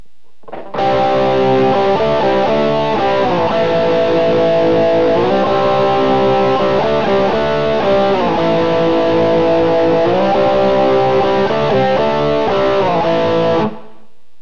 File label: bridge crunch; dirtyfingers; burny; fugly clips